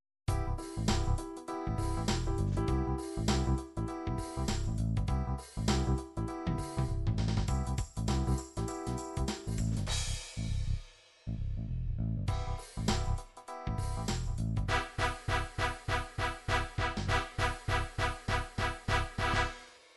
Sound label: dance funky, midi